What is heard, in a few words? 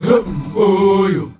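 MALE GRP CH, M. CHOIRS, Heart of Africa; Spectrasonics; Heart of Africa 2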